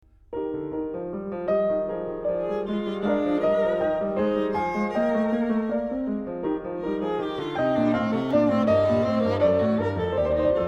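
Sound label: Violin Sonata in E flat, Variazione III; Mozart; K481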